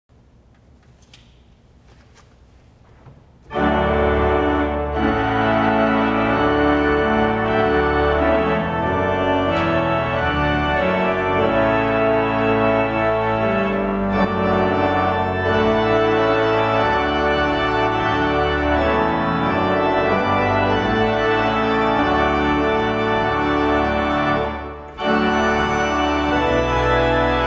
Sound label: trumpet tune in D; Clarke; wedding